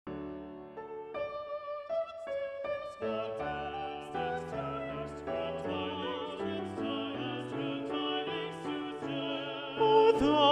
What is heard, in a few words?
Alto Predominant; O Thou That Tellest; Messiah; Handel